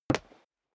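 step dress boots wood parquet single left, footsteps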